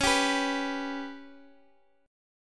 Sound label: B Suspended 2nd Sharp 5th